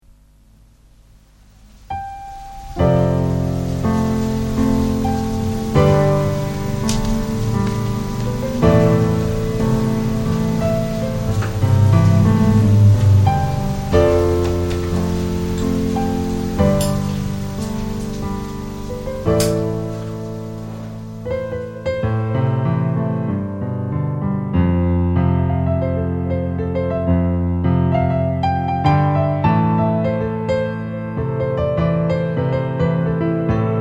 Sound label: rain; keyboard:hisanari